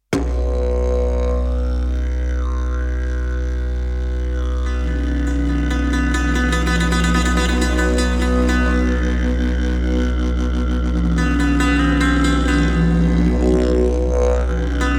Gunpowder Chant

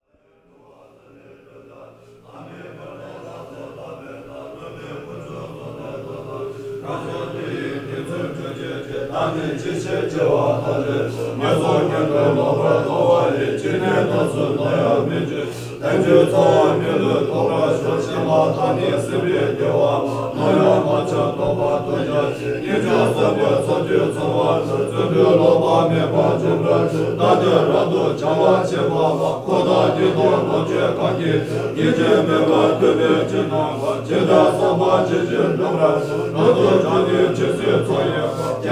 Tibetan Monks Chanting; at Gyuto Branch Monastery House No 422 McLeod Ganj; Samuel Corwin; Selected Field Recordings from India and Nepal Volume II Religious Music

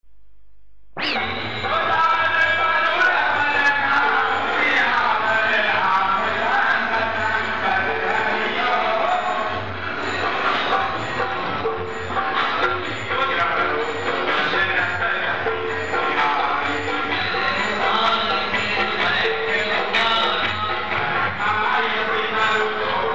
Music Birds, Field Recordings From India Nepal

